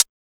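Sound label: house hi hat; E-Lab; X Static Goldmine 1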